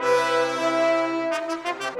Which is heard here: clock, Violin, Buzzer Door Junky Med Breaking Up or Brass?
Brass